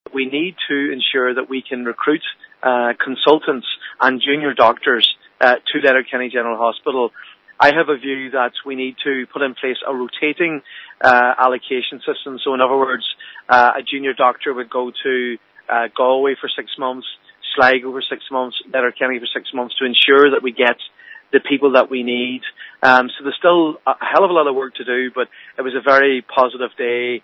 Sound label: pad